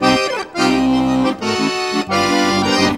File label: ACCORD HITS; Best Service; World Colours